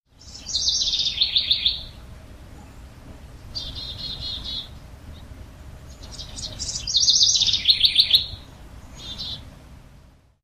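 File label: House Wren (with Chickadee)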